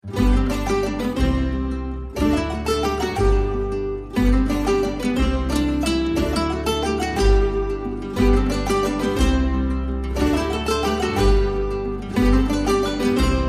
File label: percussion and strummed